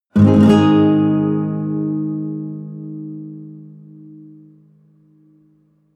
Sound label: nylon guitar chord full major flamenco